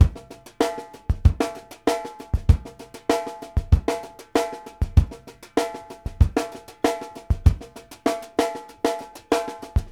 STRAIGHT L, New York City Drumworks, BR+STK 96; Sampleheads; New York City Drumworks VOL-1

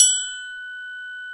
triangle, Yamaha TG-500

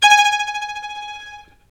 STR Violin, Solo Violin FX

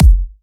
Trance Kicks, Bassdrums

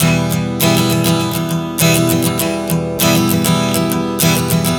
Strum 100 Em, One Chord Strumming 100 bpm

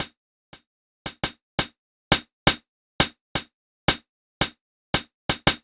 Hi-Hat, Hi-Hat Loops

